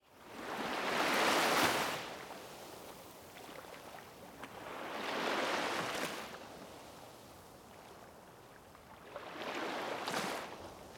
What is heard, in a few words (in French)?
la mer